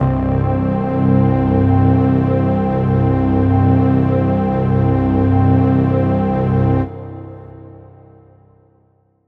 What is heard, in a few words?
Glitchy String; Zero-G Sound Sense Grime Scene; 90 s Sample CDs